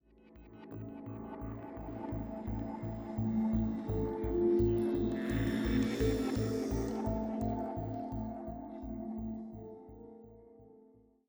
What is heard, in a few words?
85bpm